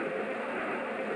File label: road wet, tires; Kart; AOS RF1 Kart Demo